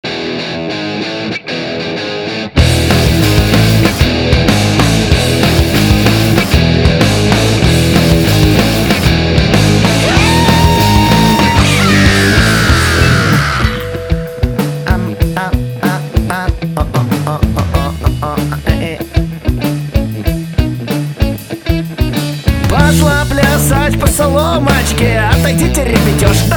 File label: BARYNIA PUNK ROCK version